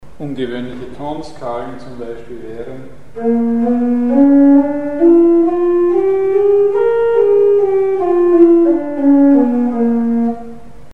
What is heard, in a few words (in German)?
Mikrotonskalen; Mikrobuch